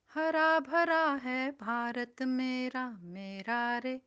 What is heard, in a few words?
singer uk